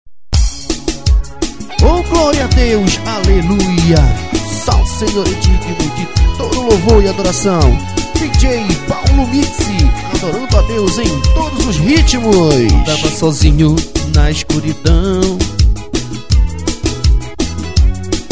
melody

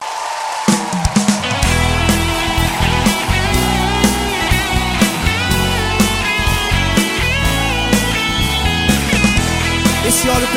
gospel

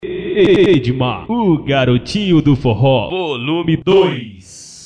vinheta, forro

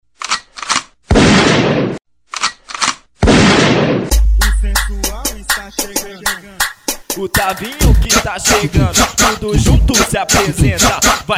funk

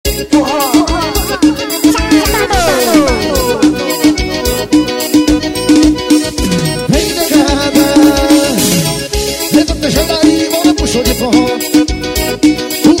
forro ei negada com vinheta

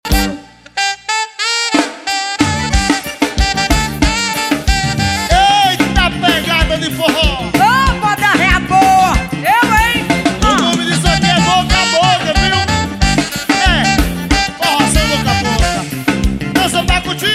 forró

